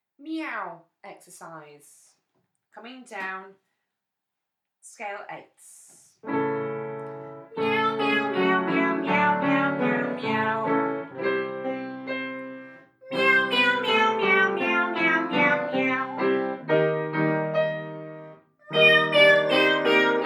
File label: miaeou exercise, singing school